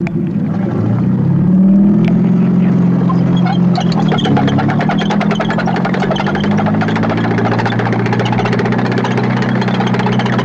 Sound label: Tank Start Moving, Run Various Speeds